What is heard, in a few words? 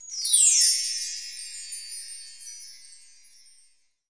belltree; Bells